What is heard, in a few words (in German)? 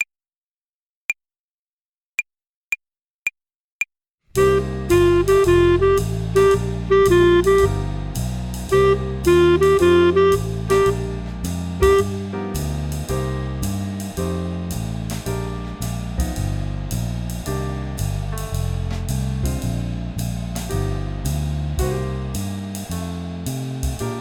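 Swing Phrasierung Übung; play along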